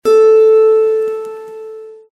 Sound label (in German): Zithermacher Ton 8 LAUTER